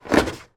household plastic milk bottle remove from fridge door